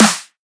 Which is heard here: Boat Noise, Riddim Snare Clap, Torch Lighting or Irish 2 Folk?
Riddim Snare Clap